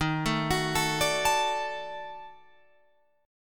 D sharp Minor Major 7th Flat 5th D sharp down Guitar Open F; arpeggiated